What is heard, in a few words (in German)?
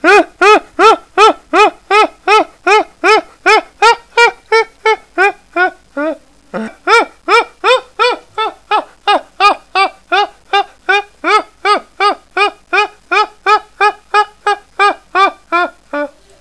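Gelächter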